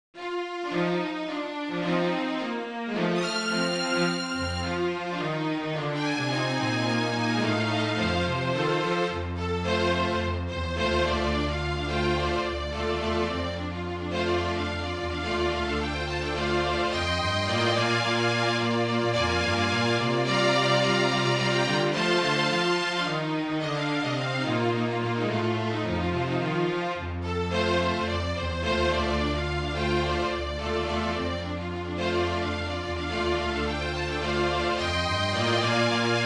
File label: Quartet